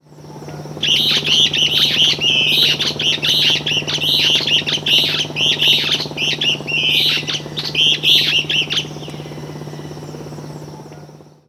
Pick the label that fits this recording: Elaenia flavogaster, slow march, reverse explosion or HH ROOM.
Elaenia flavogaster